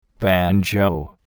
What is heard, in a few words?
banjo